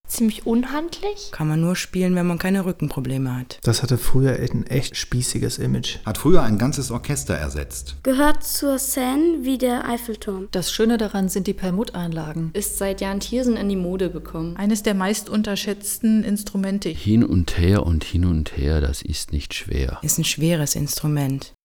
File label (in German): akkordeon; Dingsda